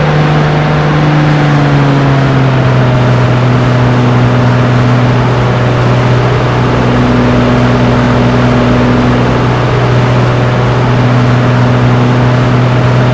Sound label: rav4 rev down, vehicles cars; tdmcars